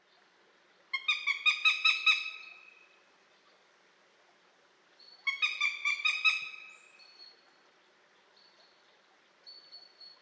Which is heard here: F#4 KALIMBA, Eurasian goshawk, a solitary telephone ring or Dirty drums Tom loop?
Eurasian goshawk